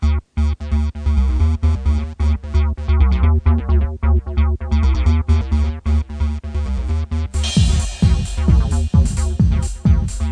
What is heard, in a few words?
acid beats